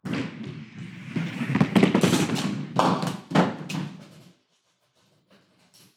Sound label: 9-Pin Throw Roll Hit, Bowling; SoundBits